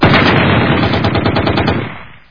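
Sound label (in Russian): пулемет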